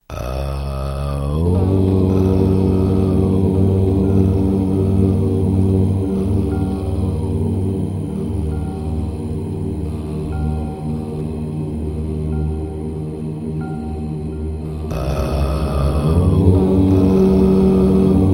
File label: om loop echo